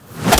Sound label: Arrow Attack And Impact